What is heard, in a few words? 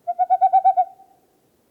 Owl